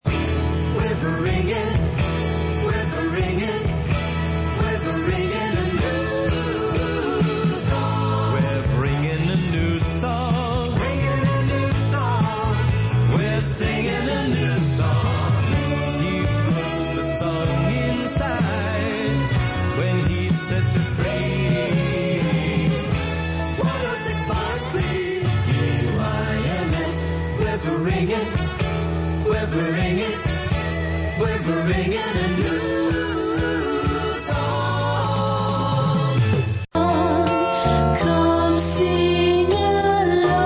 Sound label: Jingles; KYMS